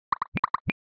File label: chemical, notifications; Nothing; Phone (3)